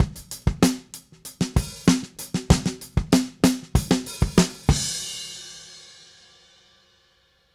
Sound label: Drums Ending, 70s Analog Funk; Sonic Foundry (Sony Creative Software); What it Is!